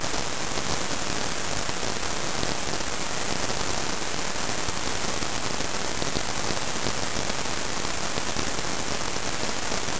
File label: crackle